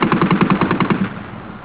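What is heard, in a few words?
machine gun; GUNSBMBS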